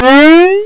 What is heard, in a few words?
swoop; casino